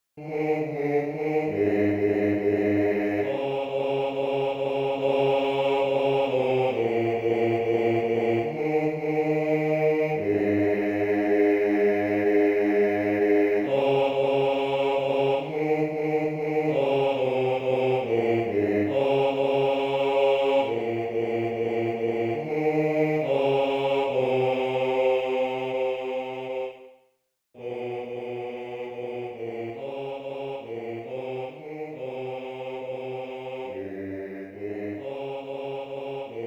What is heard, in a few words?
akogare bass